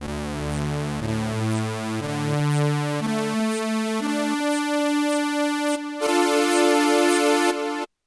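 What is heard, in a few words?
MODOR ENV Chorus Delay; NF 1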